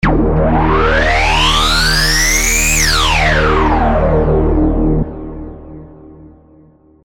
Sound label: Filter Drive